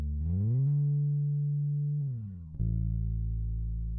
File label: bass; musdb18